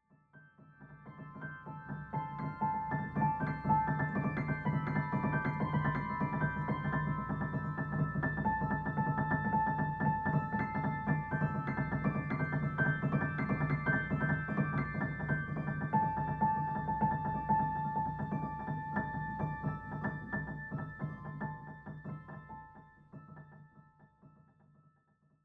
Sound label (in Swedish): regn piano